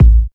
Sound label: natural kick drum sound A key; kick drum samples